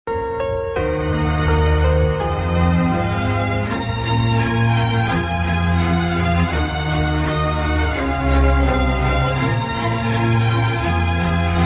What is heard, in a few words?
instrumental bgm sad music